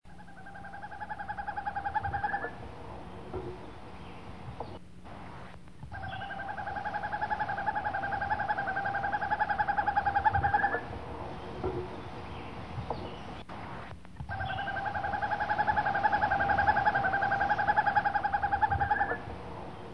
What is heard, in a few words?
Northern Hawk Owl, Owl Calls; summer